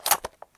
Inf Weapons Foley; Red Orchestra 41-45

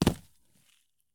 soil landing; Escape from Tarkov

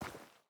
footstep boots dirt walk; Rust

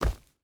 footstep hide forest jump land; Rust

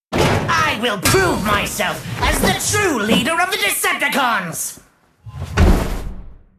ambient port; World Of Warships; port3d

